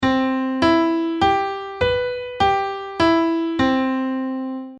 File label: Major Scale